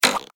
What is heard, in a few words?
Shoot Slingshot